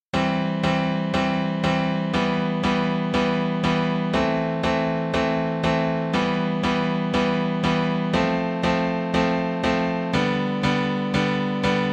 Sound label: C Em Am Em F G C C